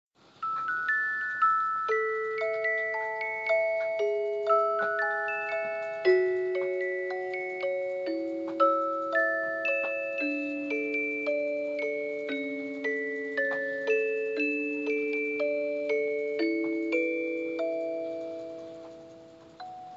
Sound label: Musicbox